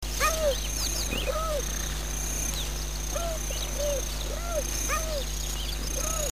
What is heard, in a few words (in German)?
mutter klagt, Geparden